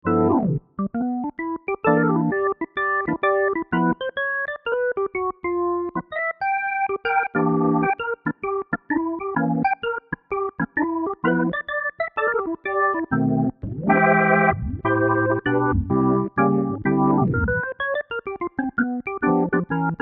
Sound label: Organ; PC3; Mellow Mitch